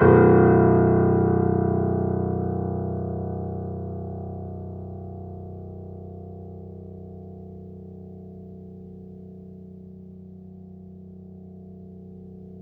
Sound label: Mp B 2 off, Grand Piano off mic; AKAI S6000 CD-ROM - Volume 2